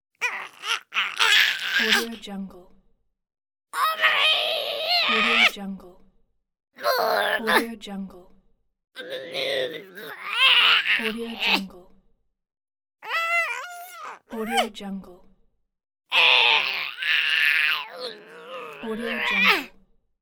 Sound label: cartoon character exerting physical energy